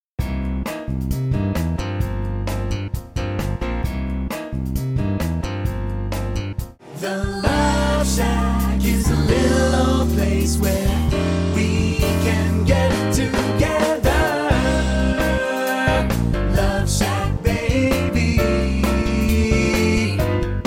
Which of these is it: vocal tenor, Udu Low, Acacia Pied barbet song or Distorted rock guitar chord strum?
vocal tenor